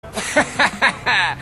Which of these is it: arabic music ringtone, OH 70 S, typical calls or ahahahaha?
ahahahaha